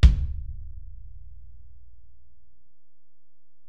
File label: Ac BD; Alesis DM-PRO